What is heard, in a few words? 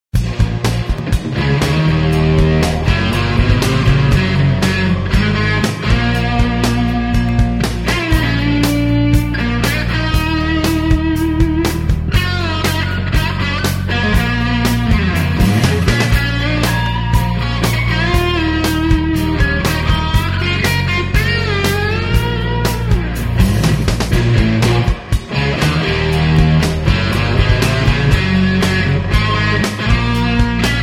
Rock